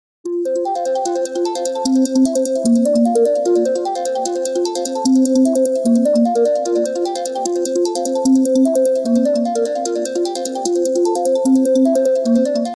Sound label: apple iphone 12 ringtone